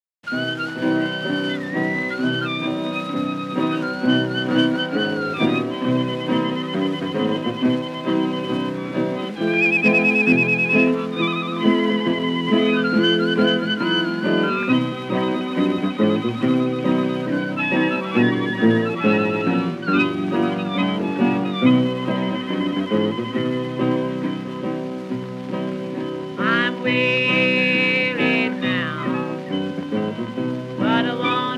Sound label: haunted road blues